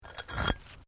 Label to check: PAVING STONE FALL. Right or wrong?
Right